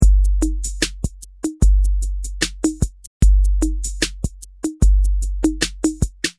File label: drum loop, ballad